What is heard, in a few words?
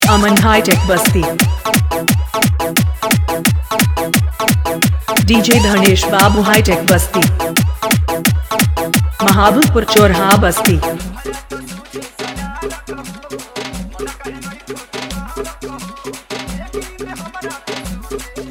Hard Bass Toing Mix